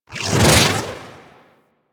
Shockwave Spaceman Dash; Warframe; Venus; Venus Shockwave Spaceman Agent

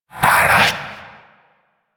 Possessed Vocal Attack; Enemies; Warframe; Priest Quest; Possessed Red Veil

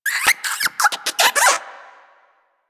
Rodent Player Lure Call; Venus; Animal Lure

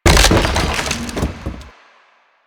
Wood Bench Destroy; Ambience; Props

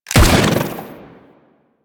Bubblegun Pistol Shot; Warframe; Weapons; Tenno

